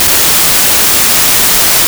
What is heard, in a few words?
drawing